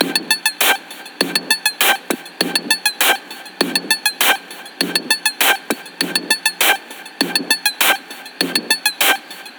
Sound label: 100 Perc Scanners; Zero-G; Future Wave